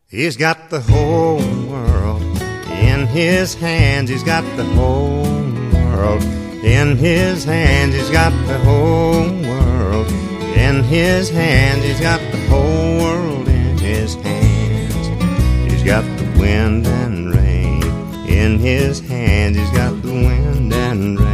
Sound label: Country Gospel